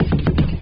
ゴトゴト・・・, 生活音; 生活音系効果音

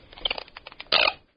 クリッ・・・カッ・・・, 生活音; 生活音系効果音

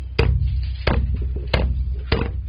たたく, 生活音; 生活音系効果音

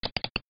ダブルクリック, 生活音; 生活音系効果音

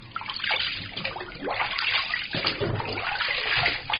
水, 生活音; 生活音系効果音

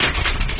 ガシャガシャ・・・, 生活音; 生活音系効果音

